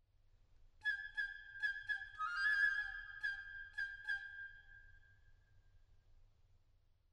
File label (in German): zaunkoenigmotiv piccolofloete